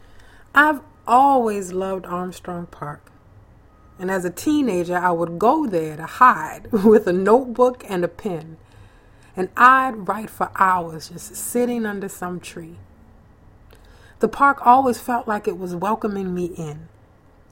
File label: Congo Square